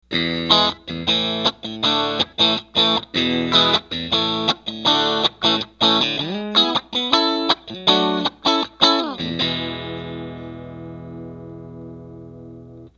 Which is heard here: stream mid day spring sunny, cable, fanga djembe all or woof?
cable